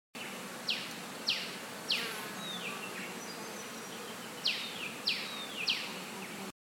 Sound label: bird